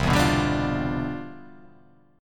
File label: C Major 11th C down; CM11 strummed; JGuitar